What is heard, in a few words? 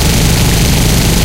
cannon t 160 loop